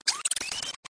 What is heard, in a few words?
exotic bird shot; Weird and Interesting Hits